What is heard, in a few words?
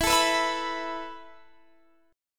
E Suspended 4th E, down, Guitar, Standard; Esus4 strummed